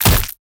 body impact ground

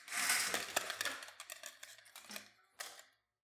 curtains; soundscrate

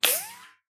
bullet impact ricochet

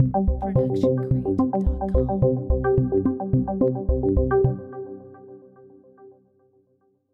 Friendly Synth Ident; SoundsCrate

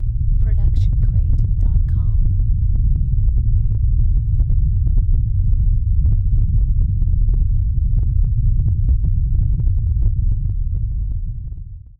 earthquake looping constant rumble; SoundsCrate

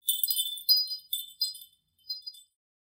Wind Chimes C

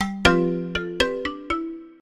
ringtone; soundscrate

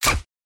silencer

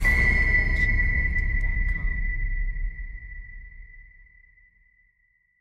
cinematic hit pin drop; soundscrate

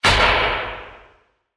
Gun Shot; SoundsCrate

